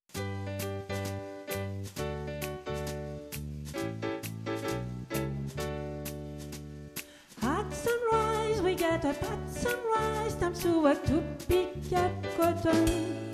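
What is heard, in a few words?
soprano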